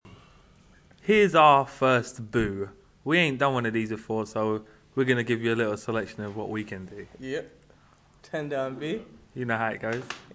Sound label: singin